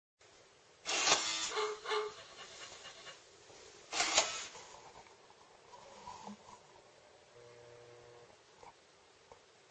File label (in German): Kuckucksuhr, Bark Coo